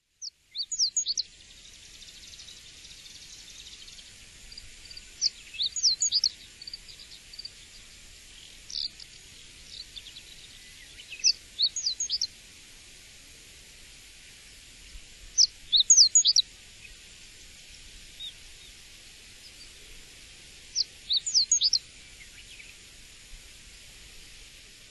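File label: Japanese Reed Bunting; koe; tonegawa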